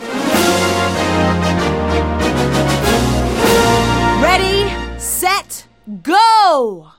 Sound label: Start Fanfare; Sonic R Original Soundtrack